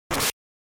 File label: Fighting Attack